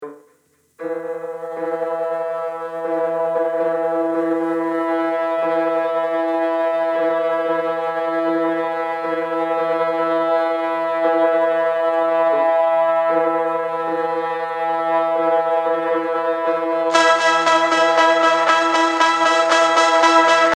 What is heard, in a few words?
guitars; tubetech